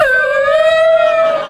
ooh; chewinthefat

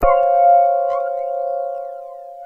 keys

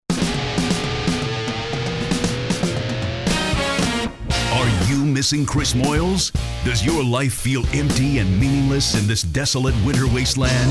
jingle; back Monday morning from 6:30am; The Chris Moyles Show; BBC Radio 1

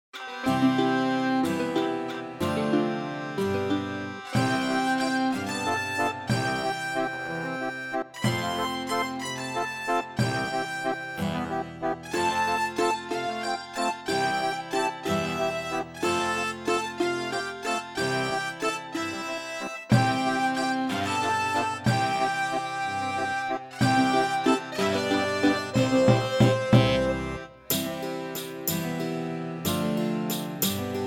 here in pudding lane instrumental; great fire of london

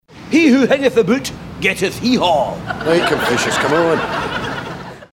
heehaw; chewinthefat; jack and victor; still game

